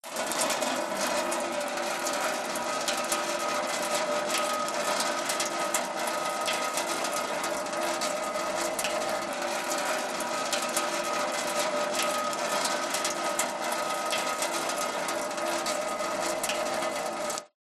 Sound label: water pour gentle light onto metal surface; nature